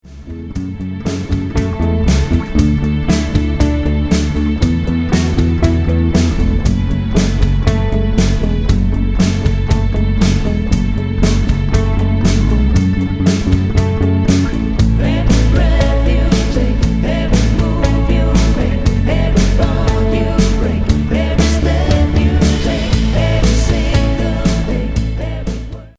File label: Vocals